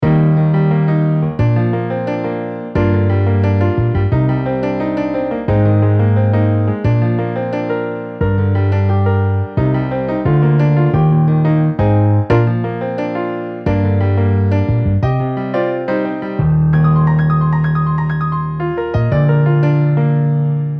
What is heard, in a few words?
Piano Bass MIDI